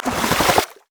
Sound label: q blast; LoL; syndra